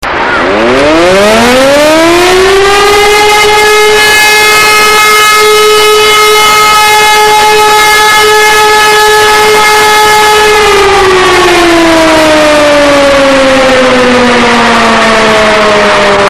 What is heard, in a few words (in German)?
sirenensignal probe; KatSchutz